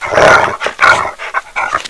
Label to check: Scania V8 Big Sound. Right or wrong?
Wrong